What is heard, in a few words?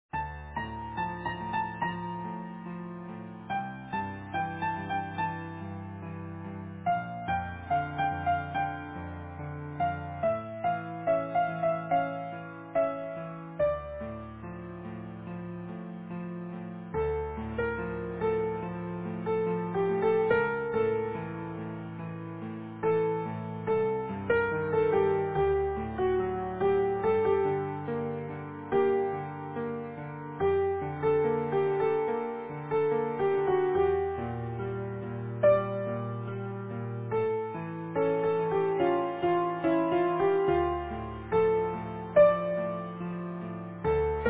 Easy Piano